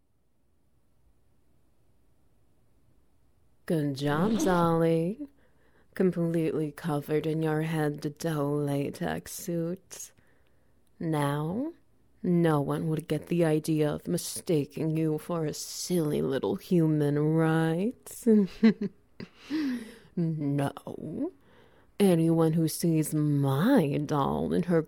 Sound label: SFX Wet Noises